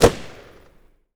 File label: m 16 ext shot